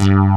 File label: MOOG BASS; Pro-Rec - Synthsonic; BASSES